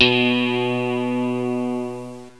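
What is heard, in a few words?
clean guitar; jazz jackrabbit 2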